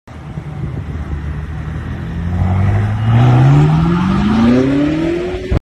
acceleration sound bmw m5 competition f90 with akrapovic evolution line